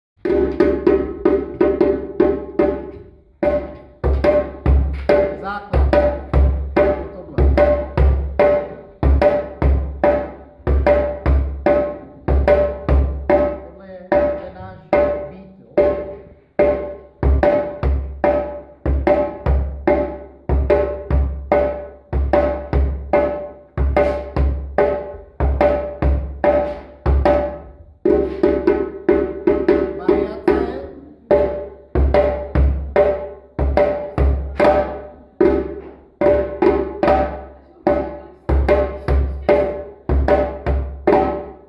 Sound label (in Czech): Bubny, djembe